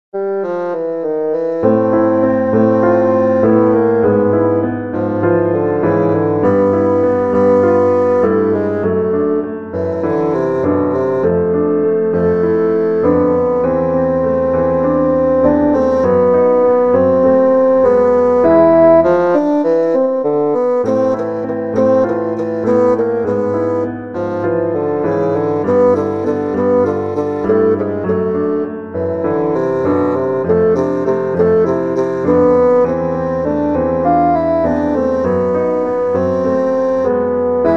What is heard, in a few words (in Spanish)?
Son cubano